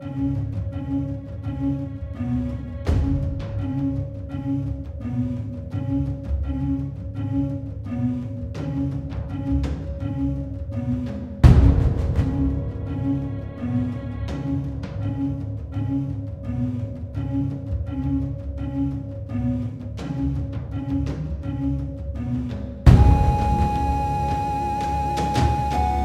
Caves Loop

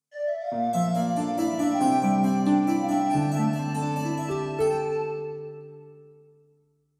Quest Successful; Sorcerer King

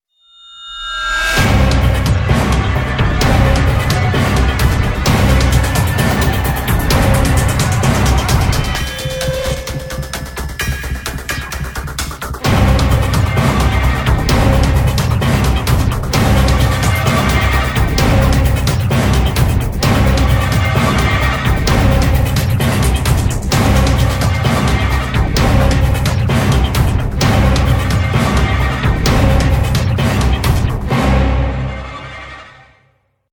Run; no more room in hell the rejected score ost

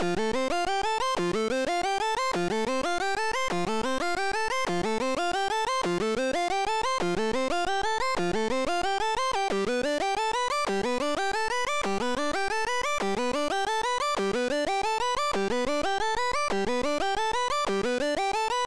Poly Arp; super crush k.o.

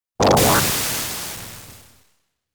Player Explosion Sound; zero wing

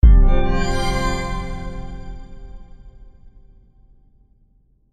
level up; The Great Story of a Mighty Hero Remastered

